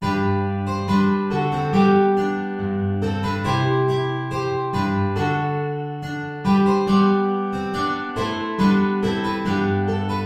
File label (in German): 4 Gitarren; Ballet I